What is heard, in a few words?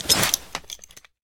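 metallic sound of noisily holstering a weapon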